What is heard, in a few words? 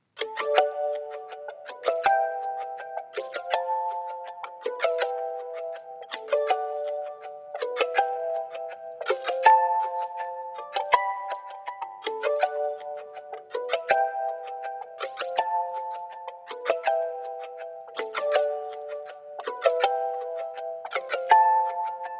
String Pluck